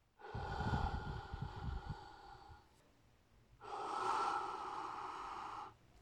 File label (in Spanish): Viento